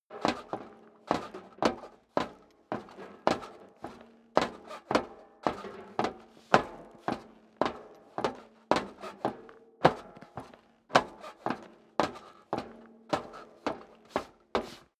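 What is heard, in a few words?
step boots metal rattle upstairs sequence, footsteps; menschen; schritte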